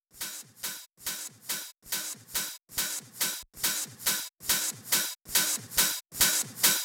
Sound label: Space Of Time Kit 140BPM Loop Fill in; G minor; Drums & Perc; Vengeance Samples